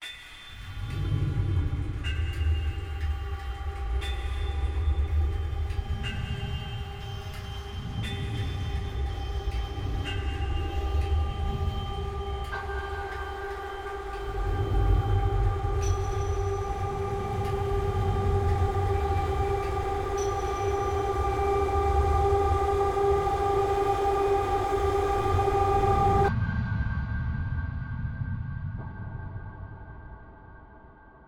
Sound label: Drowning, Sound Art